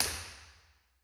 Early Reflections, Impulse Responses; St. Saviour Jerusalem BA Mid R; Ableton Live 12 Lite

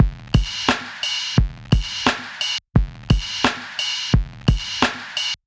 Chop 87 bpm, drums; Loops; Dewey Phantom; Ableton Live 12 Lite; Core Library

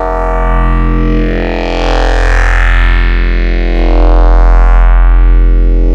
Ring Mod; Ableton Live 12 Lite; Vector; Sprites

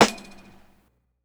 Snare; Ableton Live 12 Lite Core Library

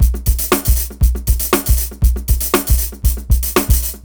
Break 119 bpm, drums; Loops; Ahmir's Voodoo; Ableton Live 12 Lite; Core Library

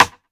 Rim; Drums; Ableton Live 12 Lite Core Library

